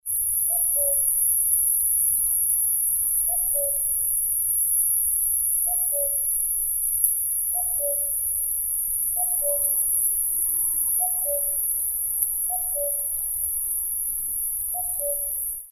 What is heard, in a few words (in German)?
Kuckuck